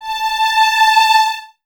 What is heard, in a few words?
Violins Detache A 5; Miroslav Vitous; 11 Violins